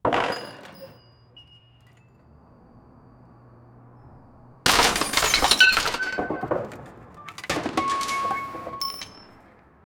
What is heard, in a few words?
crashing sounds of tin ro, robots